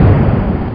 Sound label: bang Small